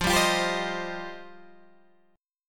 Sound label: F 7th Suspended 2nd F down Guitar Open G